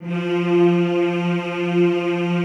F2, Classical Choir; Best Service; Extended Classical Choir

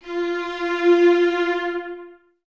String Ensembles, Violas, VLS Detache; Miroslav Vitous

